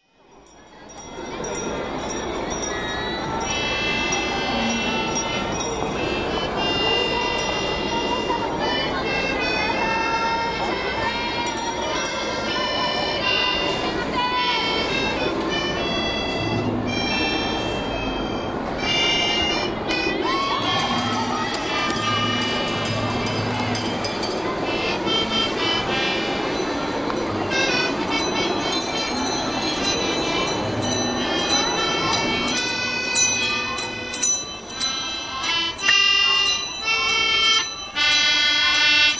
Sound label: new waltz